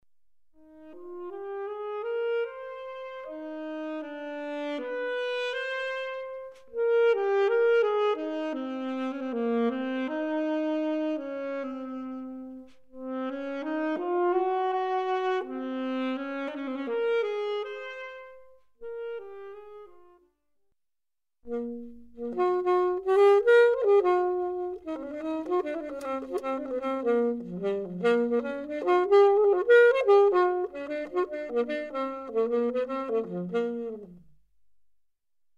saxophone

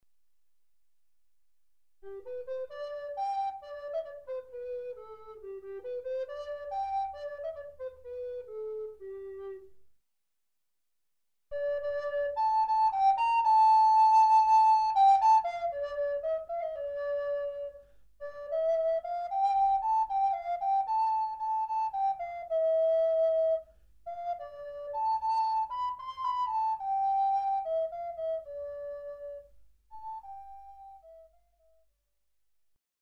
flute a bec

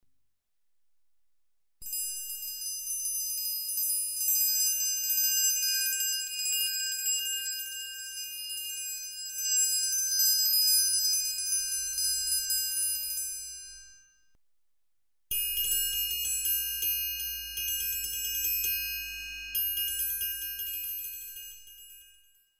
triangle

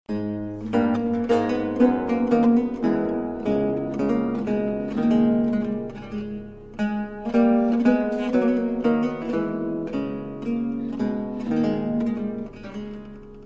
luth

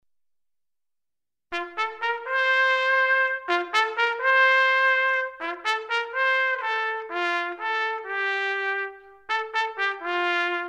trompette

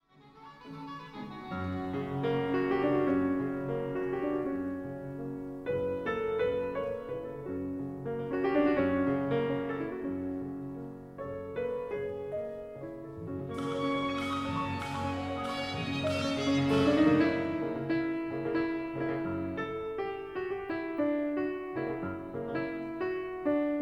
Ravel Concerto en sol majeur

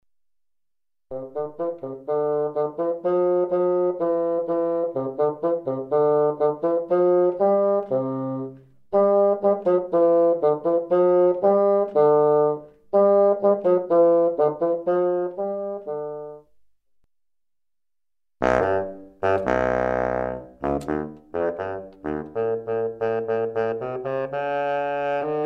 basson

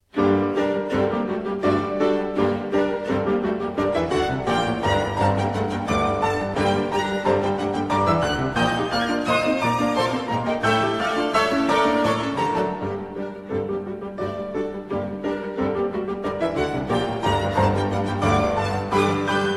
quatuor rondo; Brahms; romantisme

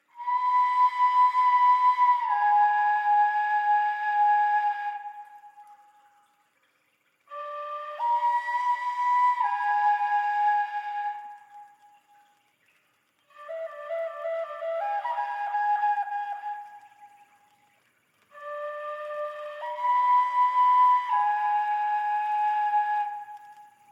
Flute Prehistorique

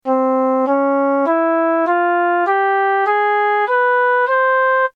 orientale

